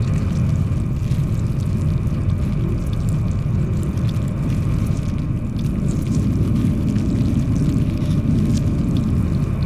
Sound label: ant ship strikecraft idle; biogenesis